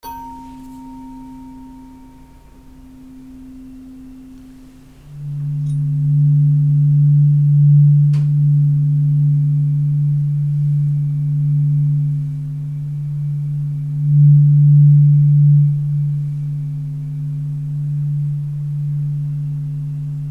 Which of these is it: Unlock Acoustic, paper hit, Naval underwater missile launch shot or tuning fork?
tuning fork